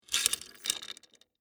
Door Lock Slide, Foley, Door, Lock, Slide, Chain, Lock; Unlock; Door Closing; BlastWave